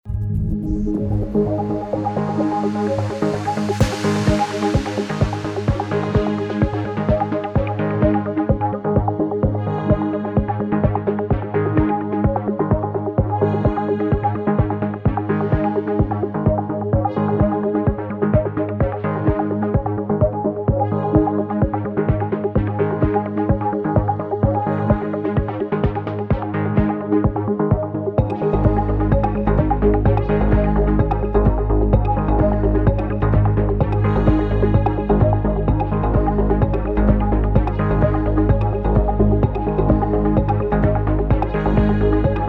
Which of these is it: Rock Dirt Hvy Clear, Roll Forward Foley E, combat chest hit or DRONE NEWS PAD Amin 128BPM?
DRONE NEWS PAD Amin 128BPM